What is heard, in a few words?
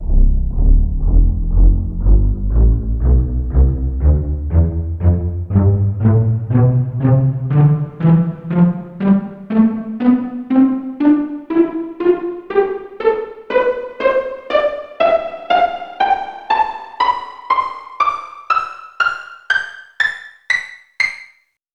pizzicato section; tonleiter